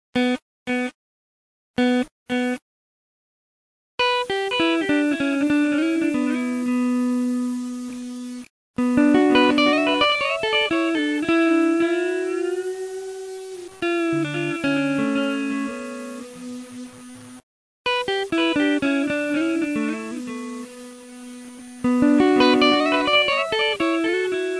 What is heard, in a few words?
Music Delay Simple